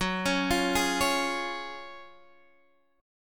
Gb Major 7th Suspended 2nd, down, Guitar, Standard; arpeggiated; JGuitar